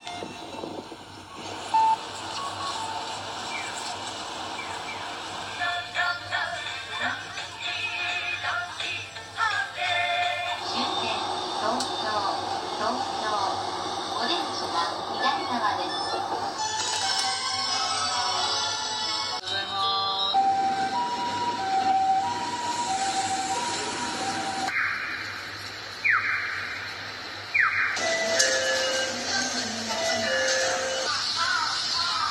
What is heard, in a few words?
Tokyo, sounds around the world